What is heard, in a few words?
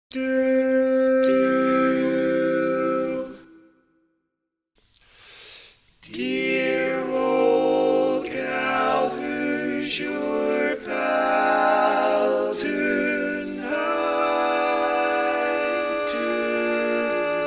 Bari, barbershop tags